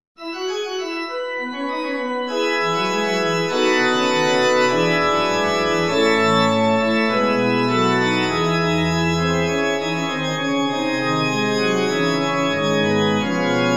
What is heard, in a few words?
prelude for pipe organ; Johann Sebastian Bach; lob gott ihr christen allzugleich